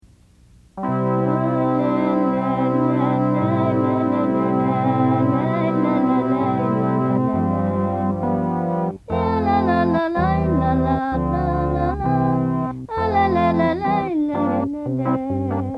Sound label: duet with organ